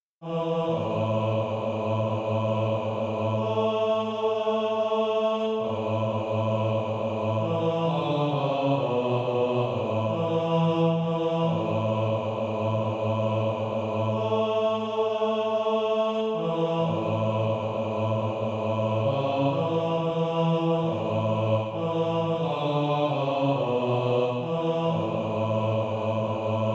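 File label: bass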